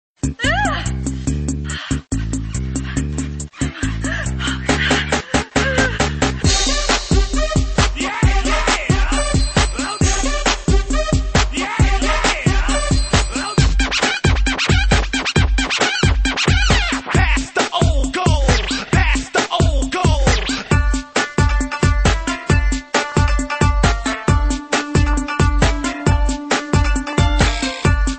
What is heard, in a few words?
Indian Remix